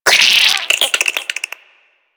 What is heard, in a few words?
Infested Minion Attack, Vocals; Warframe